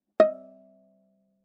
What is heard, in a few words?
Viola pizz ff sul D Eb5; MIS Pitches - 2014; Strings